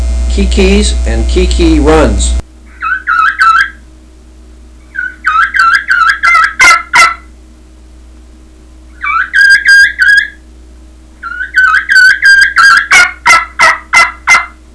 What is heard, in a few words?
ultra belle kee kee kee kee runs, turkey; dixie